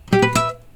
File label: ag notification, acoustic guitar fx